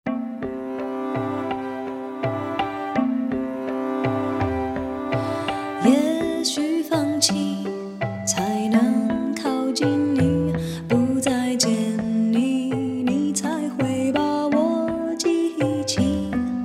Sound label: Music